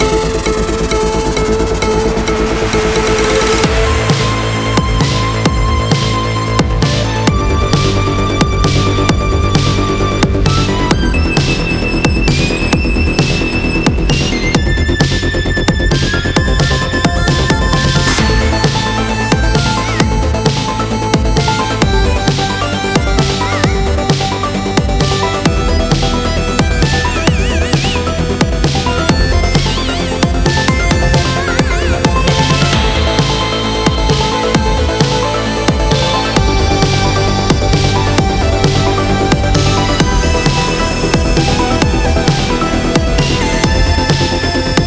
March Loop; null vector

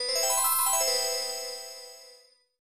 Fanfare; Teenage Mutant Ninja Turtles Tournament Fighters; SNES; Konami Fanfare

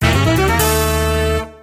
Battle Intro; Kairobotica

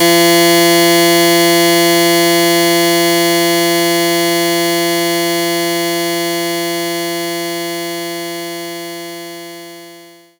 Harpsichord Ab1

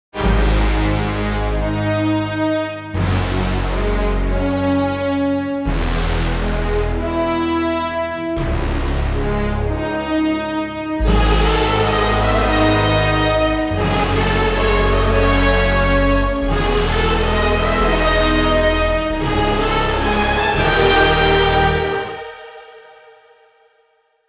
Boss Fight; prince of persia the lost crown gamerip 2024

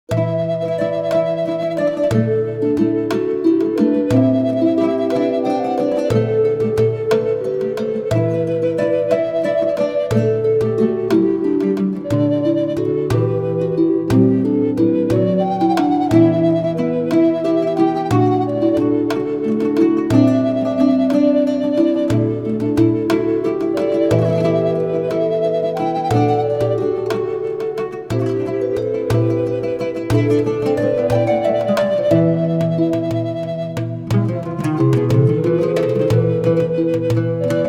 Tavern; Two Worlds 2

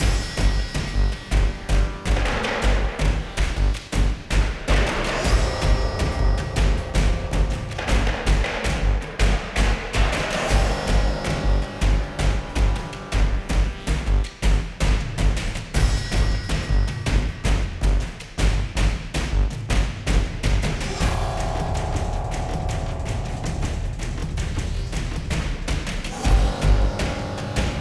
Remix; Driver Renegade

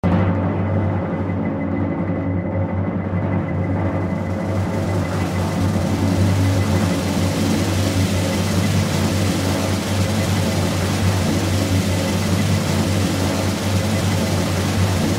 Drum Roll (Test)

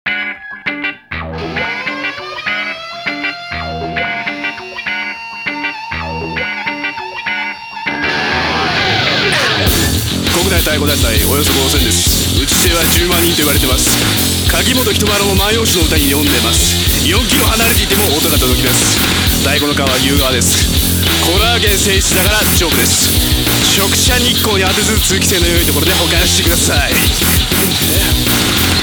Heavy; Jigoku no Taiko Jiten; Taiko no Tatsujin Doki Shinkyoku Darake no Haru Matsuri; PS2

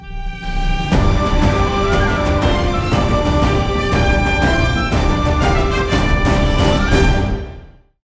Victory Short 120BPM

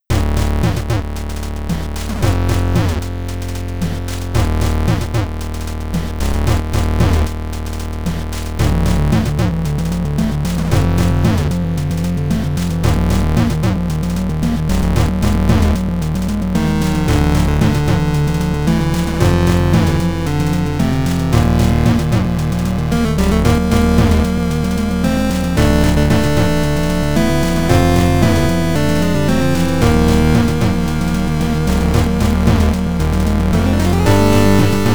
Chiptune; Castle

